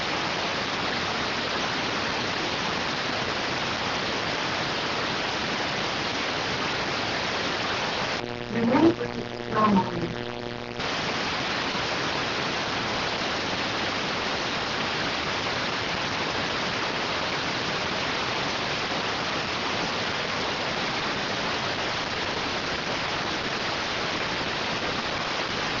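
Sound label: radioteletype receive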